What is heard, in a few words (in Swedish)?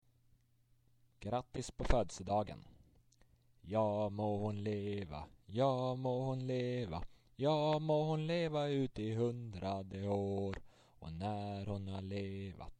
grattis på födelsedagen